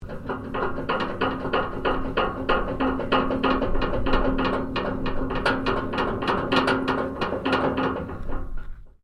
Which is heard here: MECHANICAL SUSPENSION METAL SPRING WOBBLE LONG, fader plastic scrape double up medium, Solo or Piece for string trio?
MECHANICAL SUSPENSION METAL SPRING WOBBLE LONG